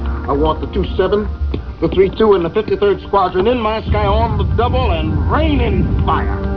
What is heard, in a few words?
rainfire; TWC Clan Site; JKDF2